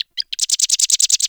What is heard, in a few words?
Forest Bird Squak; Warframe; Grineer Forest